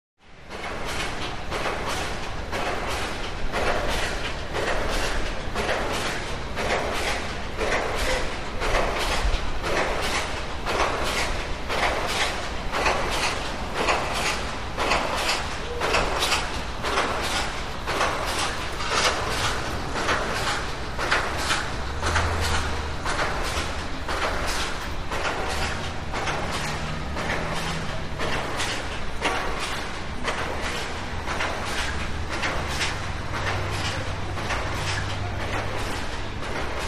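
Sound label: Pile Driver Wide Perspective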